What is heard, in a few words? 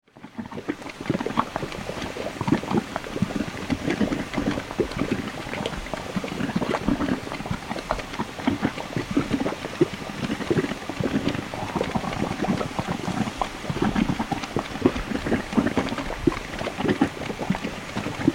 paint pots